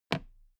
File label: toolbox handle bounce regular